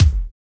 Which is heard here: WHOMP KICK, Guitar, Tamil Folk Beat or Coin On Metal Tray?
WHOMP KICK